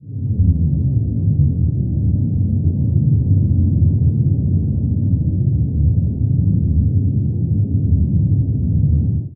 pack opening; Star Trek Adversaries; macos

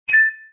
Dewdrop; yet another pixel dungeon android

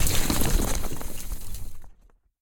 Explosion Debris; boomerang fu

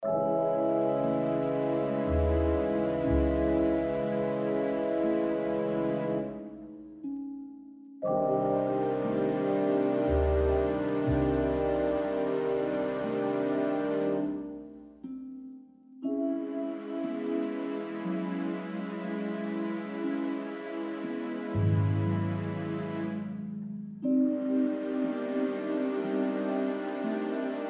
Music Loop 32 Bars; Agent A A Puzzle in Disguise; Windows

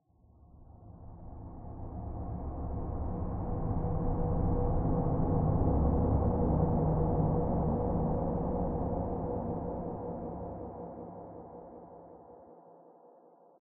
Diving Music Sweep Pad

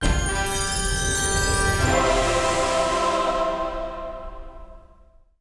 Big Puzzle END

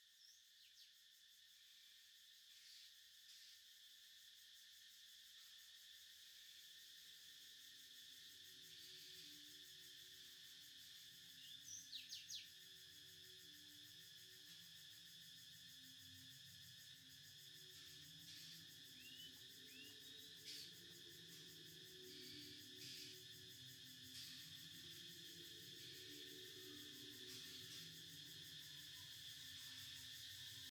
amb generic outside night; Lucius III

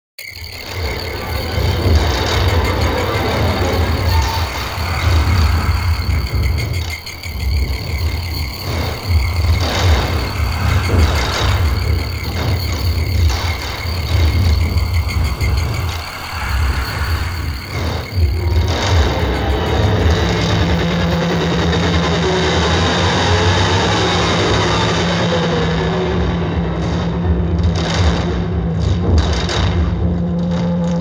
Fight; Dead Island

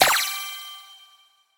Win Golden Boomerang; Boomerang Fu

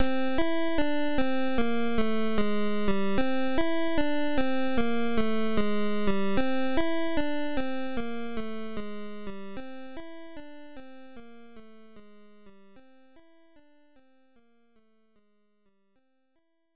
Death; the cheetahmen ii nes

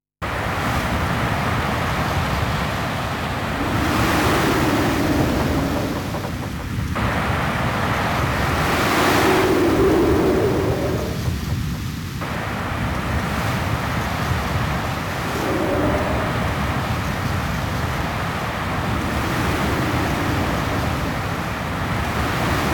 Stage Ambient Music; snk arcade sound digital collection vol. 18; Moriya Minakata

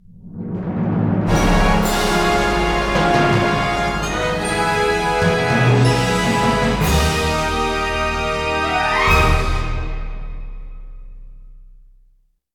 Win Jingle; Doritos Crash Course; Dolby

